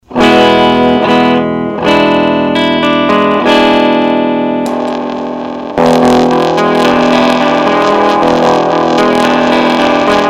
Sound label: antenna guitar